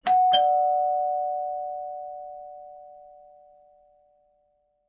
Doorbell

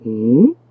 uh; rilakkuma

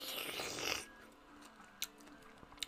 pineapple slurp

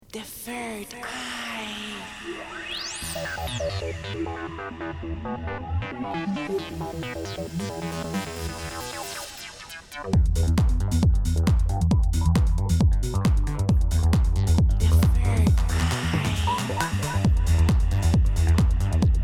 radio jingle

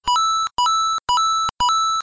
coin sequence; mario

